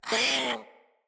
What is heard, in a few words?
Vocal Pain; Warframes; Khora